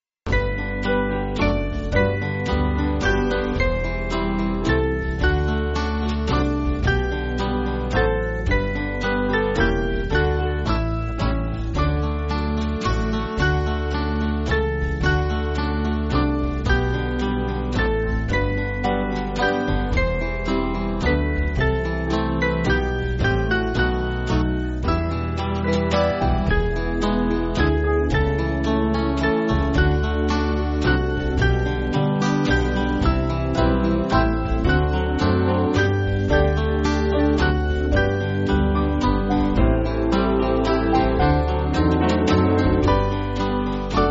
Band

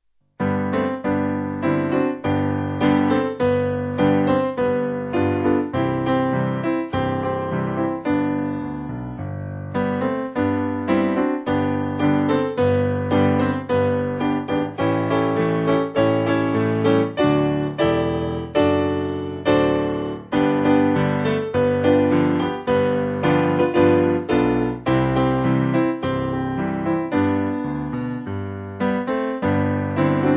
What A Wonderful Change, Piano; Gabriel

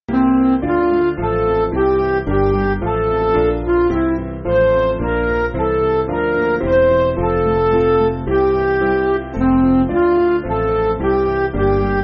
Midi; Wherever I Go; Gesangbuch

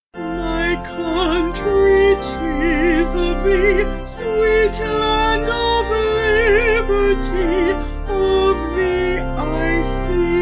My Country Tis Of Thee, Vocal Organ

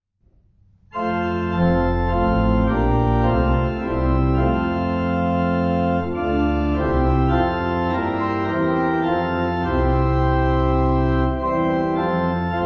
Organ

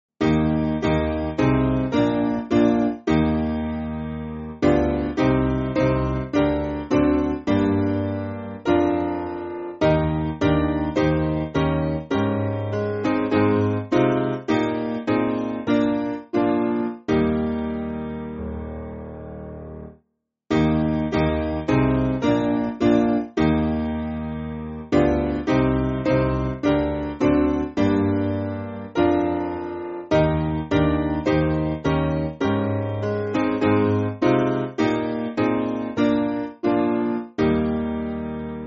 Piano; St Andrew

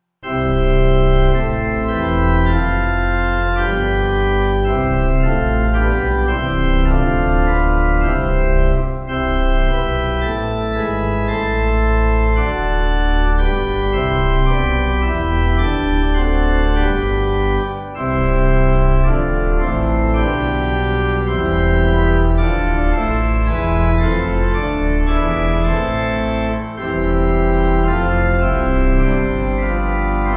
Wherefore O Father, Oblation, Pipe

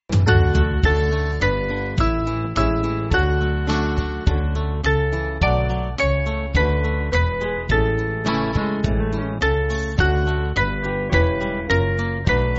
Band, church music; O Bride Of Christ; Wo Soll Ich

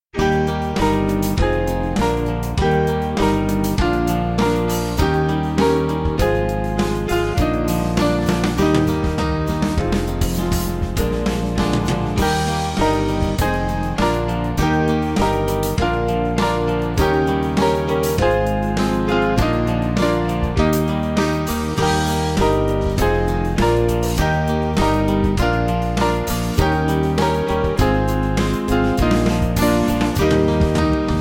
Take My Life, Russian, Band

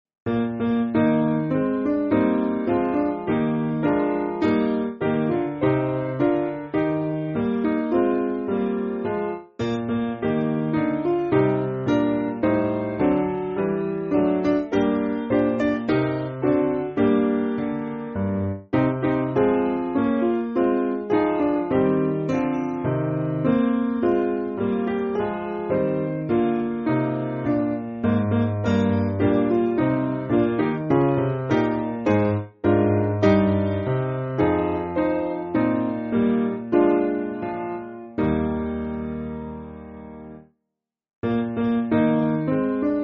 Piano, church music; Tanworth

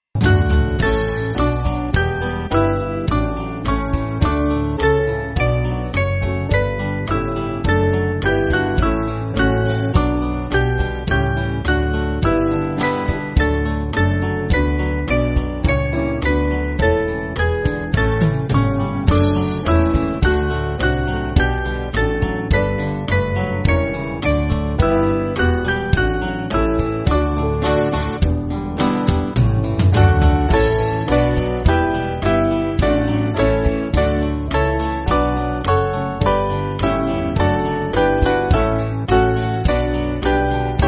Band, church music

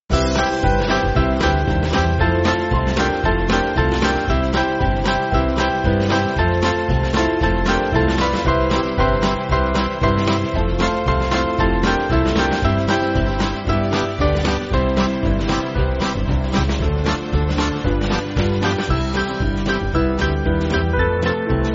Band

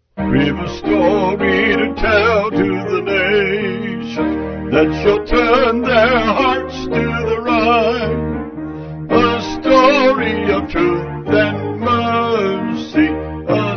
Vocal Piano

